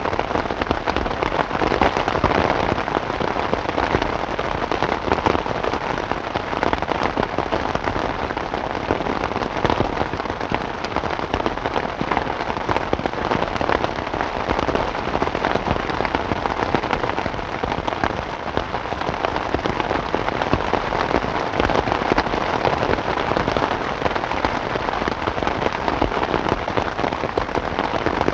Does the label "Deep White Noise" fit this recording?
Yes